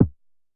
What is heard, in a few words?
Drum X Kick, Pearl Drum-X